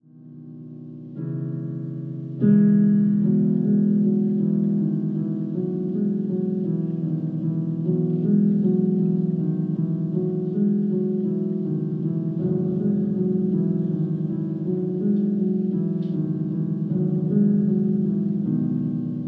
Piano (Solo)

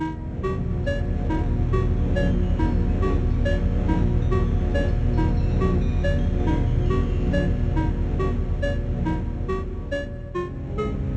clock; maple

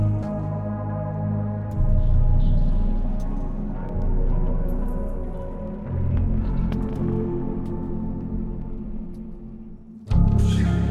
glitched drone

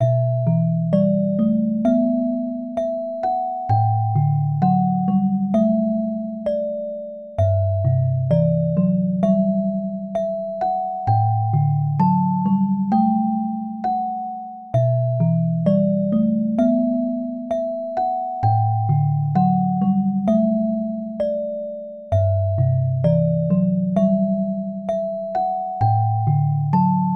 orgel; Voxel Island

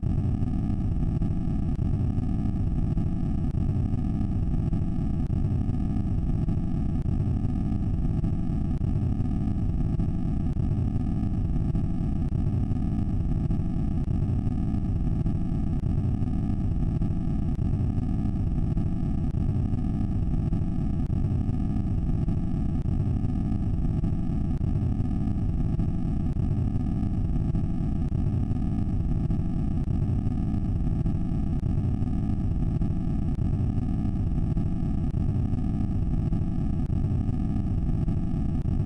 noise track

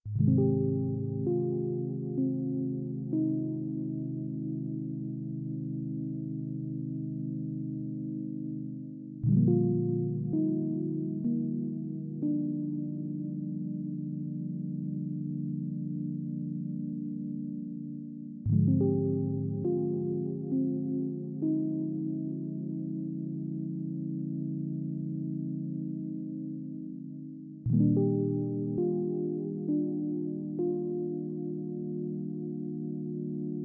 Melancholy (Clean)

